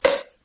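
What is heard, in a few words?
megaphone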